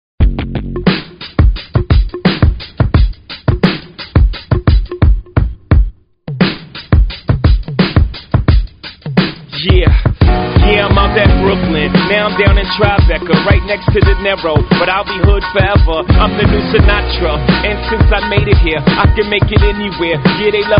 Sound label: funk; multitrax